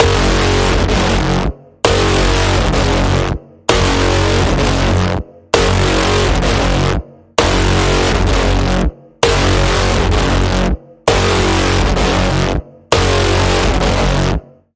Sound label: Pulsing Code Generator F sharp